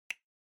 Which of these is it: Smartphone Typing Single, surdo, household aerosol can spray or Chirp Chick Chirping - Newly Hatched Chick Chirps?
Smartphone Typing Single